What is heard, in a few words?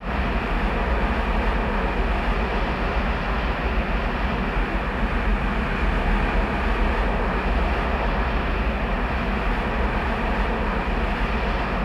NOI, DARK F AT; Total Control - Action Cutz